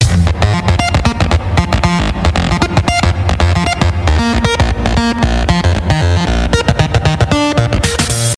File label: bassss